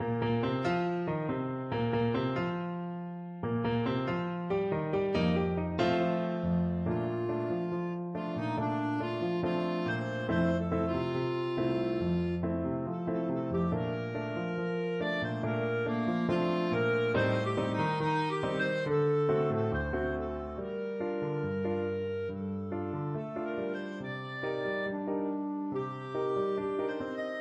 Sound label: bossa clarinet